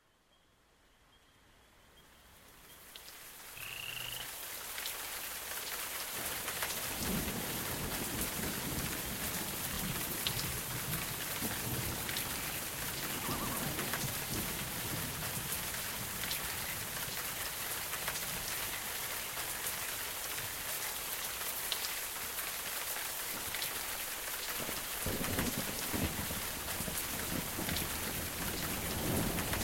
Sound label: Rainforest sounds for sleeping